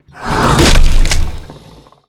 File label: brain eat; battle